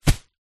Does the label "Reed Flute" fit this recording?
No